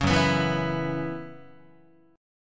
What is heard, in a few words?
Gb 7th Suspended 4th Gb down Guitar, chord; Gb7sus4; strummed; JGuitar